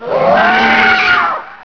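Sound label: warrior die, bugs; Starship Troopers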